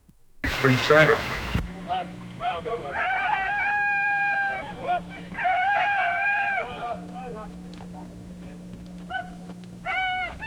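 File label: outdoor horse auction ambience; events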